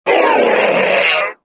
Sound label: death; galak